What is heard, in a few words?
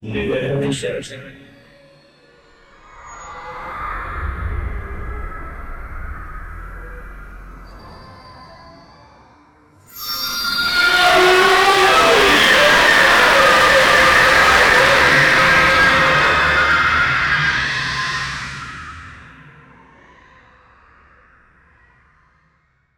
a planet crashing into ea